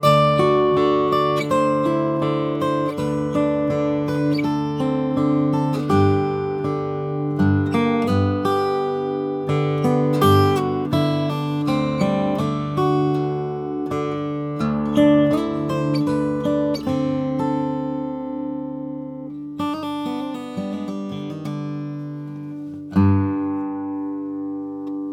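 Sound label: gtr, guitar; sony pcmd50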